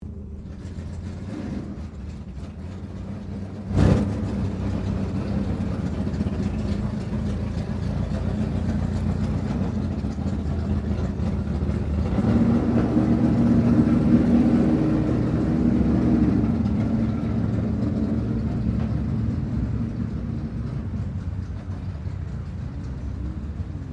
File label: Dragster Pass By, Sports, Dragster, Pass, By, Slow, Idle; Race Car Sound Effects; BlastWave